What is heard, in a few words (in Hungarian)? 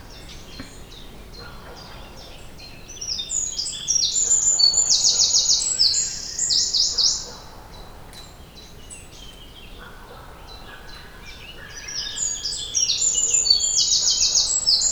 harmonikus, hajnal; Perkupa